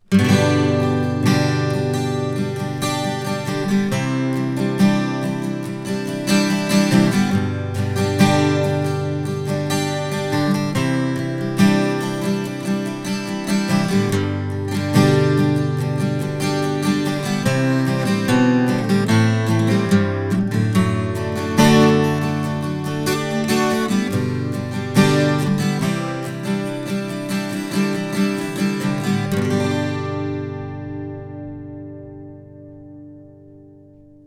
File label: guitar